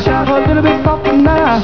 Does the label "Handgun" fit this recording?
No